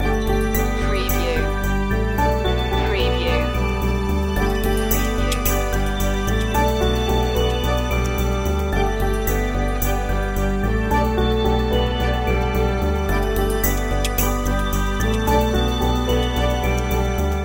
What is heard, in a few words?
footprints in the snow loop